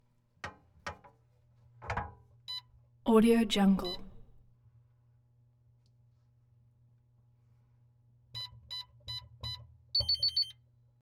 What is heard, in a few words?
motel safe electronic buttons inside; collanderson